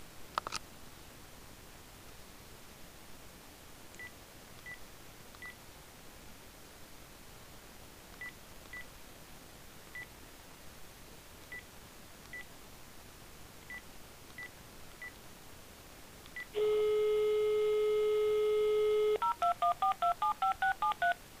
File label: failure to ring; Cantillons